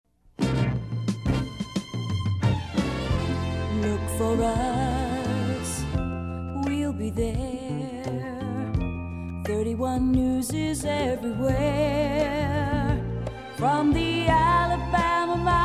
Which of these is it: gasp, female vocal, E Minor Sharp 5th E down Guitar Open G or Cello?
female vocal